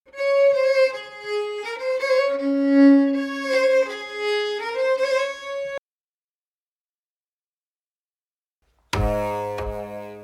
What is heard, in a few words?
medley instruments intro